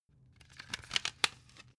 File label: cd in cover soft